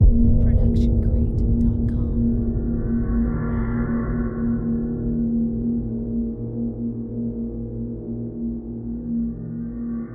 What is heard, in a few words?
Suspenseful Ident; soundscrate